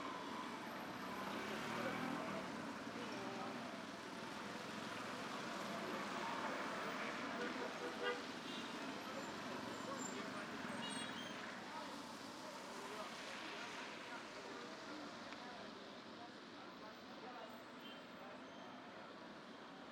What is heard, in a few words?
distant city; ambient; Counter Strike Model Pack; CS:GO; dust2